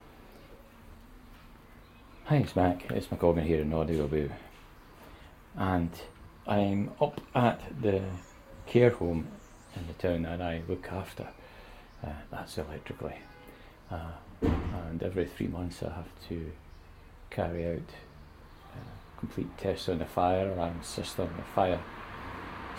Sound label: day care centre soundscape, carehome